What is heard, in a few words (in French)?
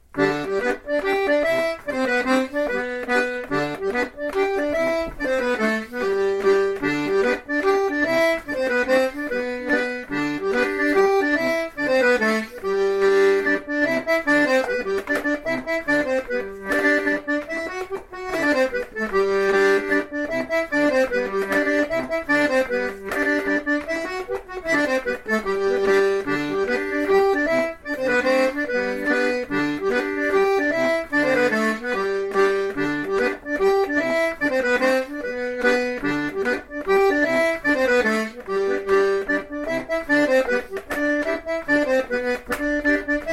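gavotte, accordéon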